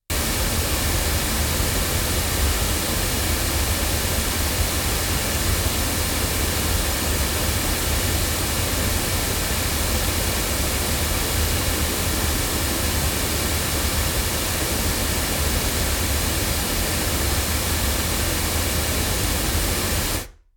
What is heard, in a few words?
Golden Age FC3 cardioid loudspeakers